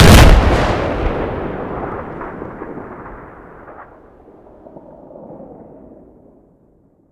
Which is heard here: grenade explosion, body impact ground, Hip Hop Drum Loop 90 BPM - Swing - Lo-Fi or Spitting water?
grenade explosion